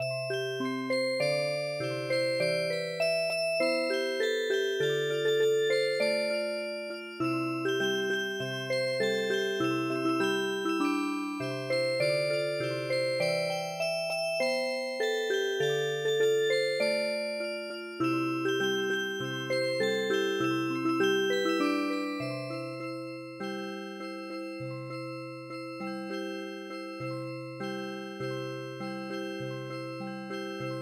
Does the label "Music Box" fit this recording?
Yes